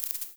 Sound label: Small Crowd, Experimental; Ableton; Convolution Reverb